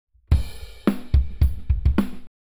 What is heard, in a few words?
Simulation vertical Drums 90deg; SMA EM32